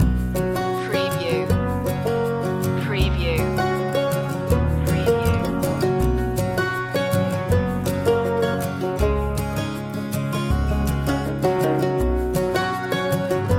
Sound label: blue grass mellow loop